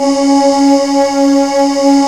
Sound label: low monovox; Rubber Chicken Software Sample Bank